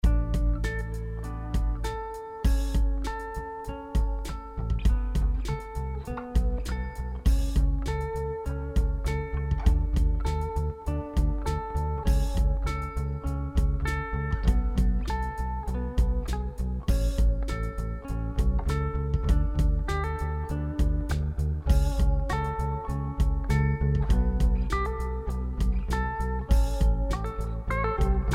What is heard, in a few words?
Jam, Guitar, Bass; Jamjar